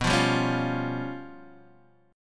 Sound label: C sharp 7th Flat 5th B